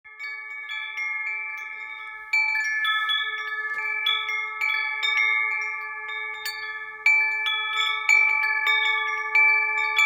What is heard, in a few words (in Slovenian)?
Vetrni zvon 432Hz